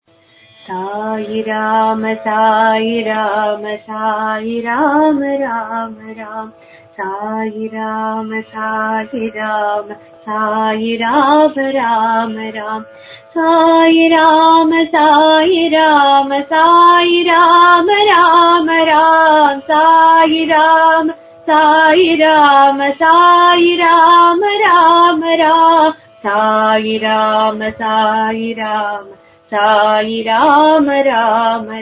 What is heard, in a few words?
Bhajan; Sairama Sairama